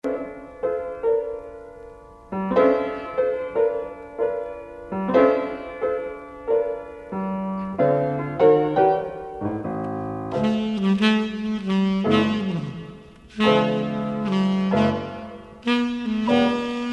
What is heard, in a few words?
piano sax